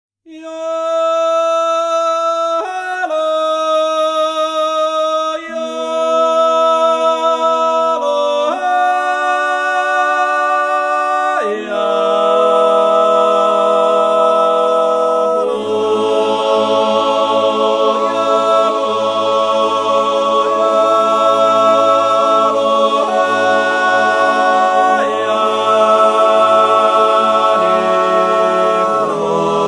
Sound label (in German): Naturjodel